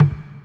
Bucket Hit, Percussion; Ambient Grooves

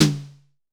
Drum X Snare; Pearl Drum-X

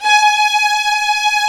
STR SW VLN, Vlns 8 fff, Agitato; Roland L-CD702

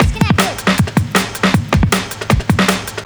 drums, Hardcore Breakbeat, 157 Dmin; Big Fish Audio - Hardcore Breakbeat Action; Disconnected

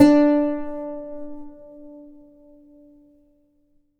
Plucked Lute D4; Acoustic and Electric; Polyend; Simulacrum Sounds; 1 Shots

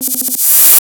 Star Instruments Synare PS-1

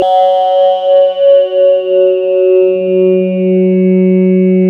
SYN SCANNE, Microwave; Sound & Vision - Gigapack I CD 2

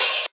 Open HH, Cymbals

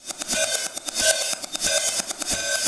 Cymbol Particle; Particles

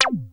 WASP; Masterbits; Vintage Voltage; SEQ-FX

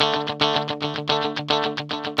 GUI, 110 BPM

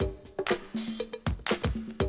120, Classic Drum Loops

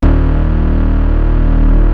DEEP; BASS SOUNDS

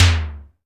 SIMMONS SD-5 drums

